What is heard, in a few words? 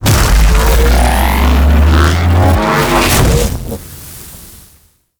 Void Dragon Body Ring Cast; Enemies; Abilities; Warframe; Duviri